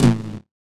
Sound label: mod tom, Mod Drums; Synths and FX; neuro; Sinistarr